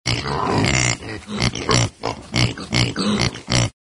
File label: pig grunts